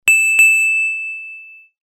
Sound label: Steel, Ringtones; Samsung SGH-F310 Serenata